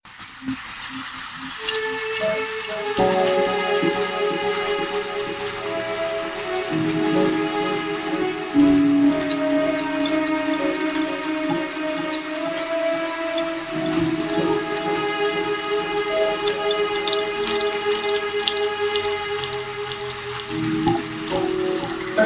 Harp, Rain and Bamboo Strings

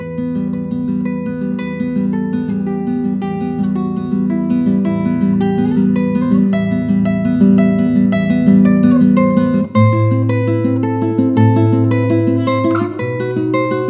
Romance for Guitar

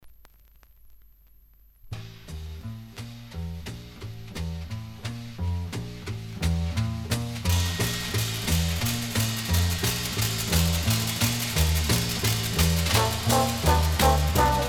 Orchester

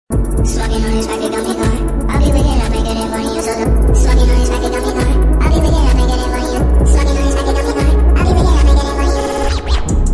slowed reverb

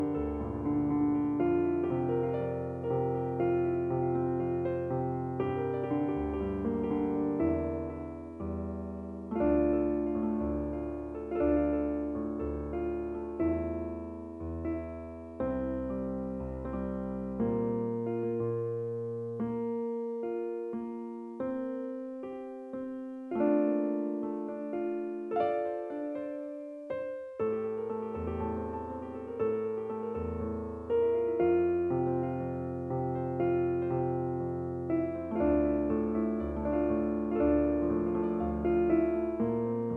Piano Relaxing

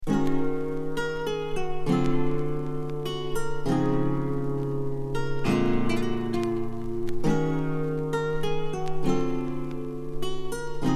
Lofi

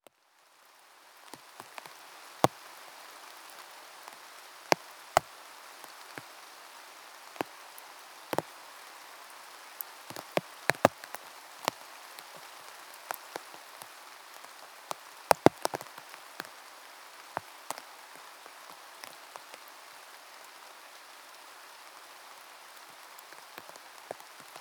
Rain, Nighttime Ambience

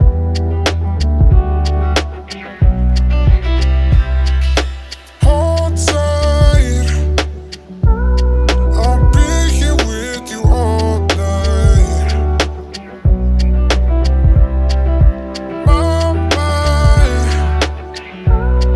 Relaxing Study Beats

